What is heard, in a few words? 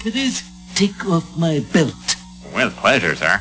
belt; Simpsons